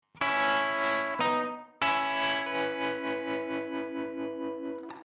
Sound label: waves